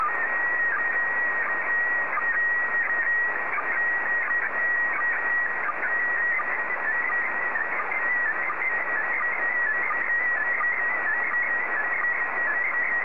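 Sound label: PD90, slow-scan television; KO4WJF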